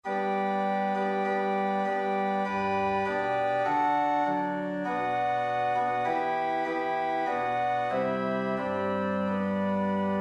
Hymn Organ; Judge eternal throned in splendour; Rhuddlan; Hymnal 1982

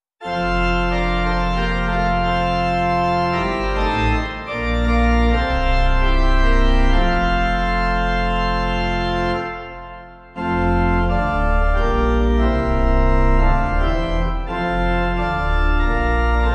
organ, America; God bless our native land

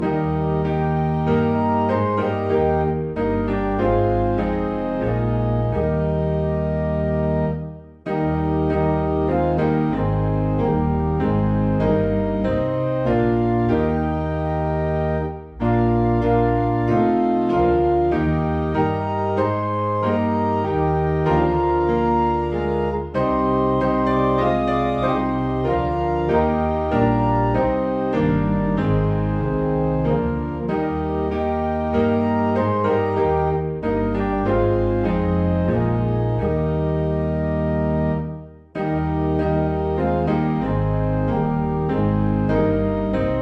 Hymn organ piano; Be thou my vision; Slane

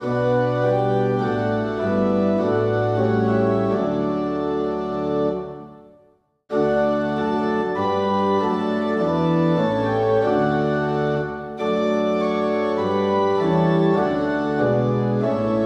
organ, Hymn; Take my life and let it be; Hollingside

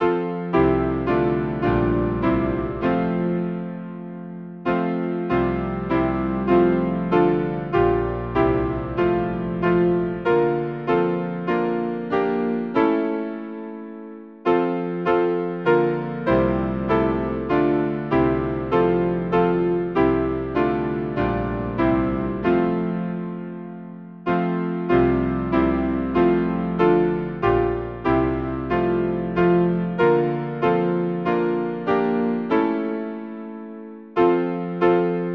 Hymn, piano; Lord who throughout these forty days, St Flavian

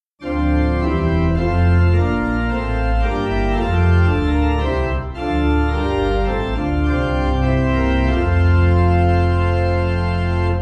I Sing The Almighty Forest Green Pipe; Hymnal 1982

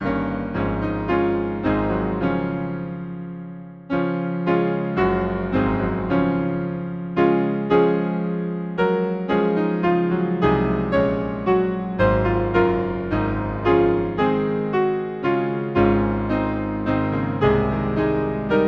Hymn piano; My God thy table now is spread; Rockingham

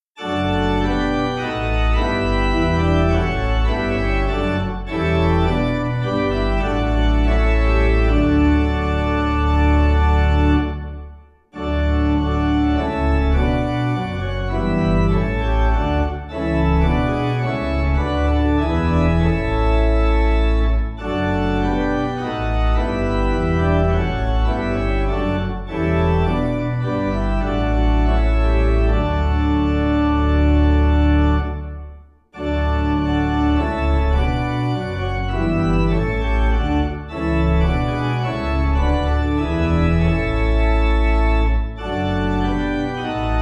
organ, hymn; epworth; Hymnal 1982

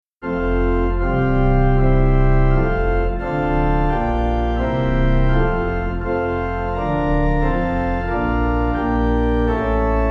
organ, hymn; st flavian; Hymnal 1982

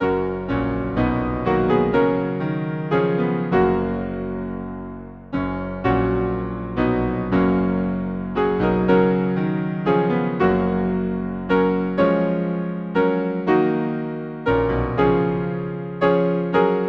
Hymn, piano; As longs the deer for cooling streams; Martyrdom

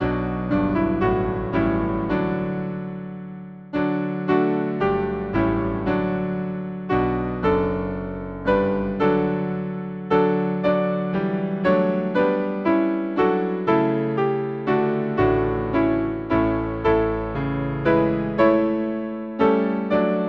Hymn, piano; When I survey the wondrous cross; Rockingham

